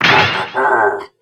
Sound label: Turtle block